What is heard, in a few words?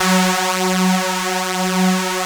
PULSA F#2, LEAD SYNTH; Best Service Alpha Dance vol.2